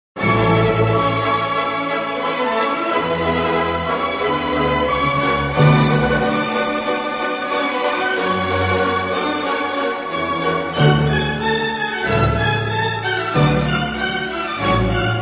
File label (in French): Concerto pour violon et orchestre Theme; Tchaikovsky